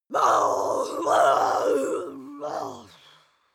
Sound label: witch scream groan unequal